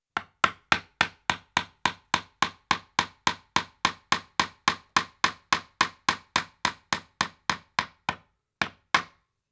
Large Tack Nail Hammered; Construction; BBC Sound Effects Library

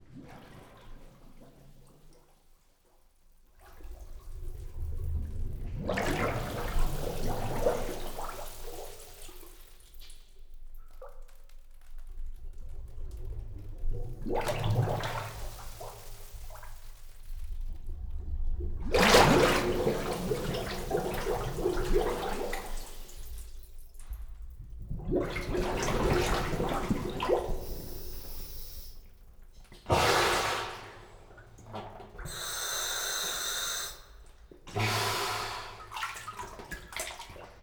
Dive in cave; Scuba Diving equipment and underwater diving; Ivo Vicic

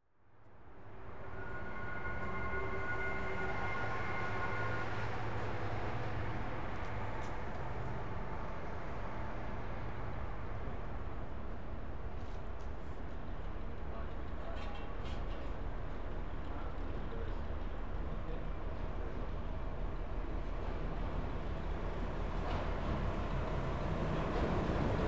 Italy, Roadside Station; European Soundscapes; BBC Sound Effects Library

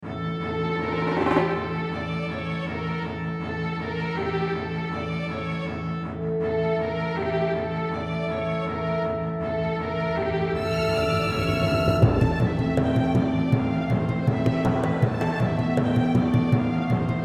Battle, RPG; The Frontier - RPG Set

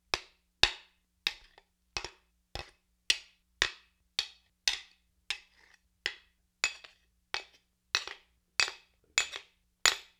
Seax Axe Blade on Haft, Weapon on Weapon; StillNorthMedia

